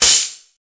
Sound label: HHO, Volca; drums; hip hop; WallsVado Volca Sample Pack